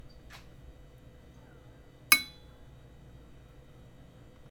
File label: Lamp Ting